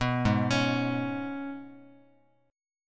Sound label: G sharp Minor Double Flat 5th G sharp, Guitar; G#mbb5; arpeggiated; JGuitar